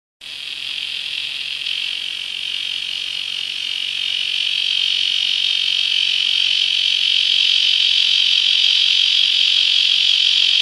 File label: cicada, T virescens